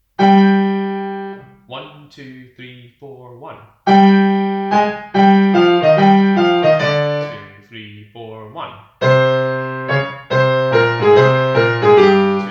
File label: satb; home rehearsal